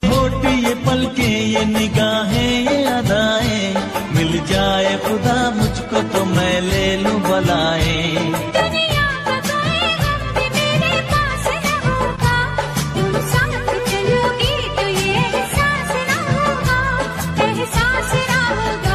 Old Ringtone

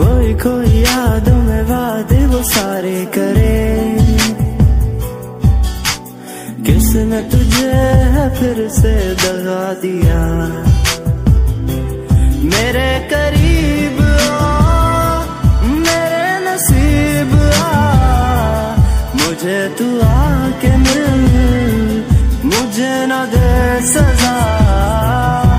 Sad Bollywood Song Ringtone

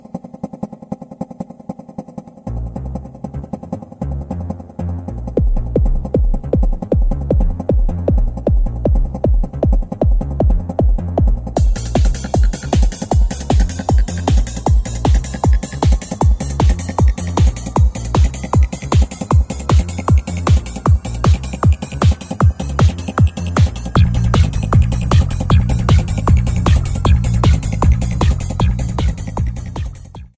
Trance Music Ringtone; ringtones

